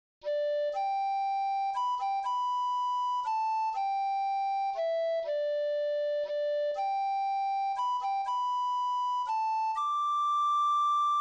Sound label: recorder solo; amazing grace